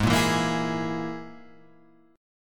G sharp Suspended 2nd Sharp 5th G sharp, guitar; x,x,6,3,4,5,6,5

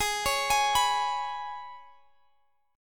Ab Minor Double Flat 5th, Guitar, Half Step Down

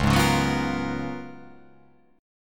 C sharp 13th C sharp

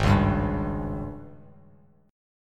Ab Major 7th Suspended 4th, Bass Standard; strummed; JGuitar

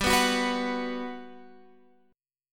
G Major 7th, Guitar, Open F; GM7 strummed

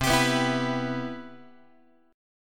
C Major 7th, Guitar; CM7 strummed; JGuitar

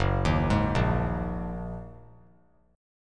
Gb 6th Add 9th Gb down; arpeggiated; Gb6add9; JGuitar

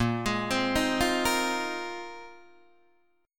Bb 9th Suspended 4th Bb down Guitar Open D

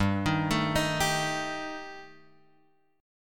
G Suspended 2nd Sharp 5th, Guitar Drop D

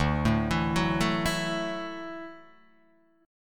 D-Major Add 9th D down Guitar Open D

